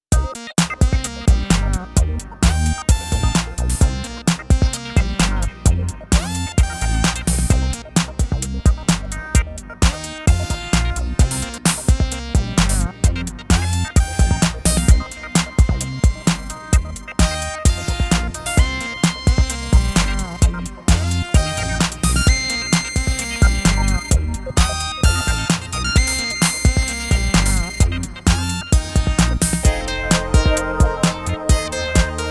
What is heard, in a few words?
Nordlead